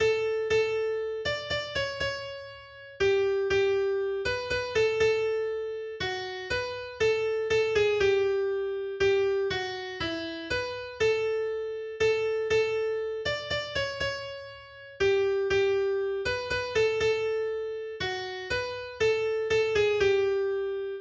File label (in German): Santa Lucia Solo AkGit